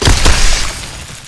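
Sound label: elemental buster water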